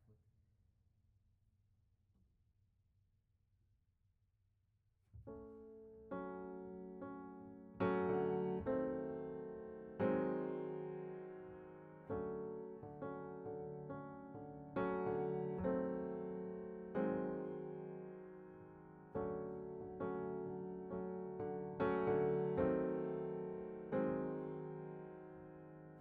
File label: Piano B